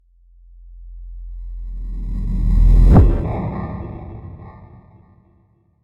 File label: Scanner Scanning